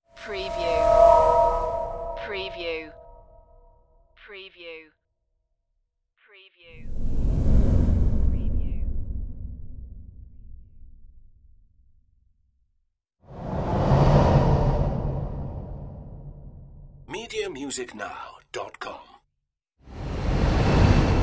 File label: space whooshes